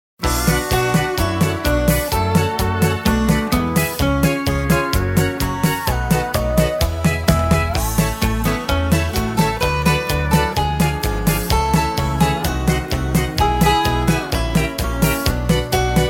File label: music